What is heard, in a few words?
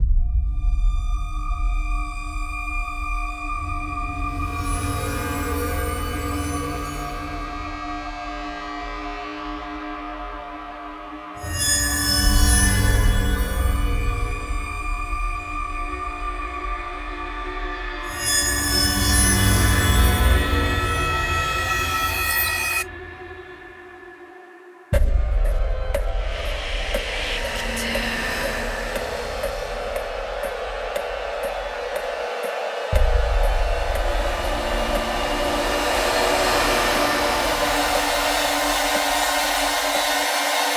Drum Mix, Horror